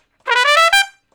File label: Trumpet Shuffle (E); Solos